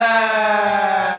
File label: ah group of men